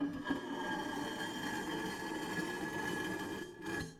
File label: Stone Door Opening